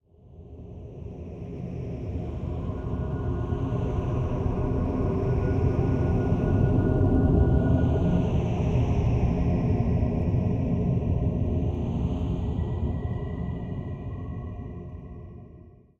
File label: Mansion Mood Amb; Pitch Black Audio Pong